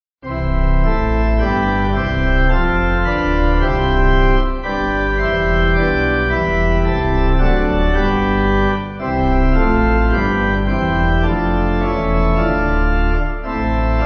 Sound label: On This Day, Pipe